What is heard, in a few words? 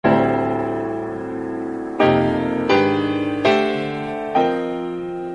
melody